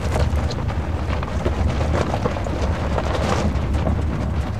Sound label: Auto Tires Rock Dirt Loop; Automobiles